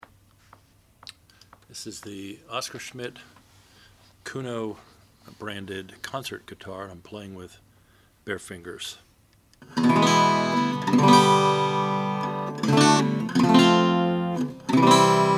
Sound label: oscar schmidt sunburst; 1935